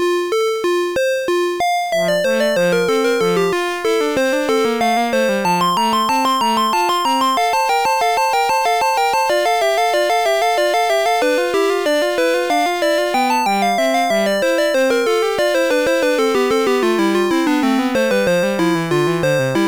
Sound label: invention